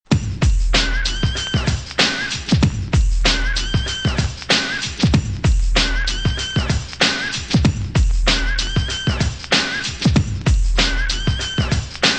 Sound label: drum loops